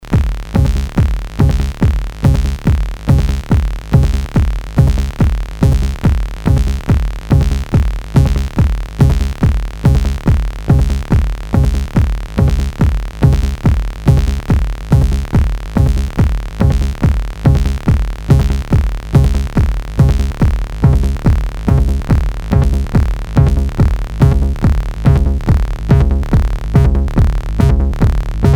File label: TS303 vs MS20